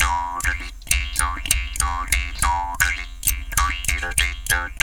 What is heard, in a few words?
JAW GROOVES, Didgeridoo